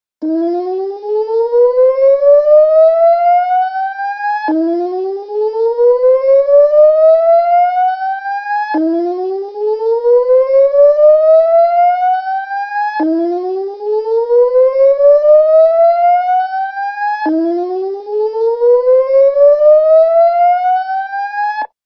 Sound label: siren whoop